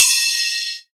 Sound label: trap crash cymbal sound e key, Royality free crash cymbal sound clip tuned to the E note.; drum sample; one shot